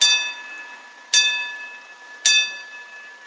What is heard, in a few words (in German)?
D Glocke